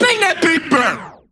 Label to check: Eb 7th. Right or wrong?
Wrong